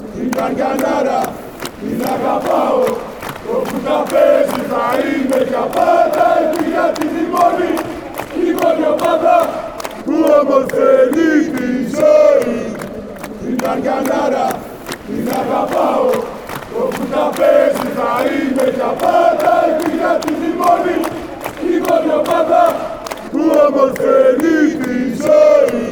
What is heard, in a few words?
chant, fanchants